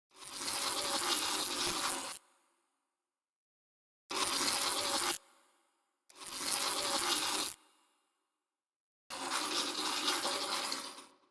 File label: tracks moving